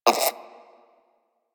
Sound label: Vocal Tranquilized Grunt; Warframe; Hunting Critters; Cryptilex